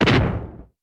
explosion; Rescue Team3

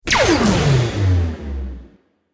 bonus start; New Yankee in King Arthurs Court

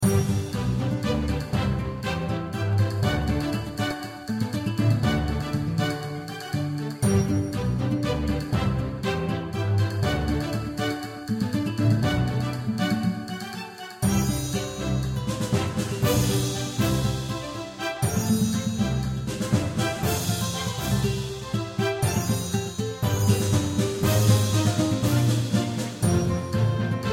music; New Yankee in King Arthur's Court

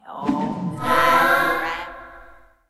magic; Rescue Team3

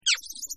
mouse; Delicious Emilys True Love

